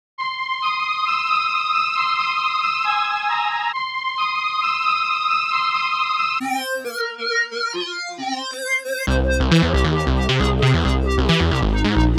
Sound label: electro